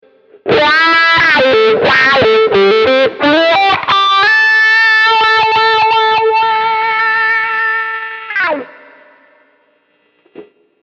wah, guitar

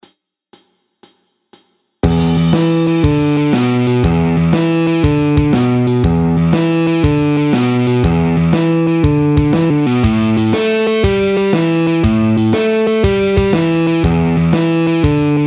blues sound; teiban

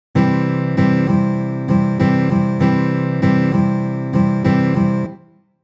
stroke, guitar

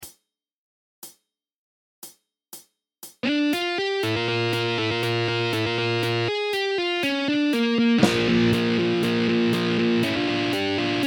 rock solo slow, guitar; teiban

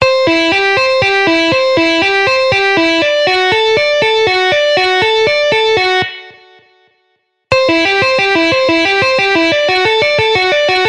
tapping sound, guitar

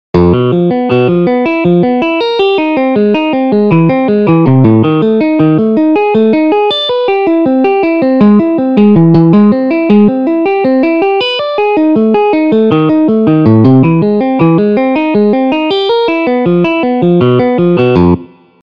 guitar sound

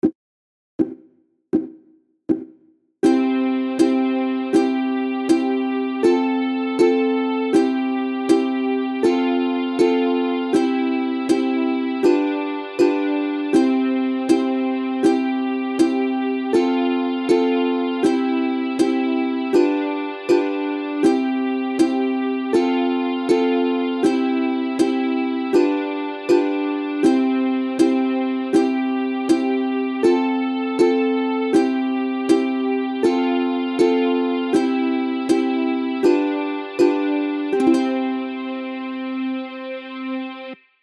twinkle sound

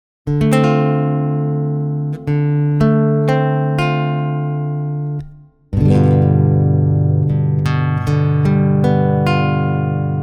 bossa, guitar

